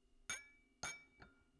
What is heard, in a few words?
Glass Clink